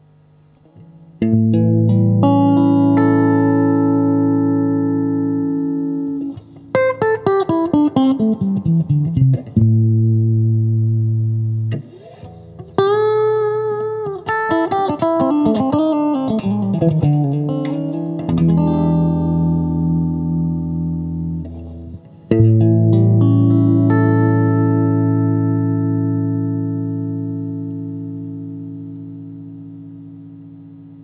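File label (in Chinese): 60S OCC 单晶铜 清音 2档, acoustic guitar; kindman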